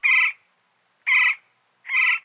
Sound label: Maze Bird; Pirates Of Treasure Island